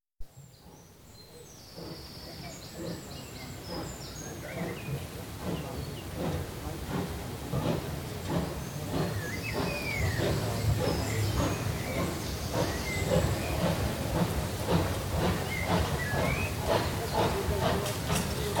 Railway Platform atmosphere as distant steam train accelerates up hill, with birdsong; BBC